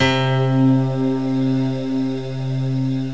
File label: pia voice